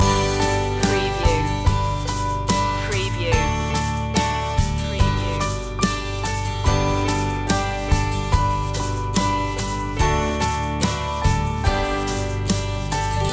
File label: mary had a little lamb loop; children's music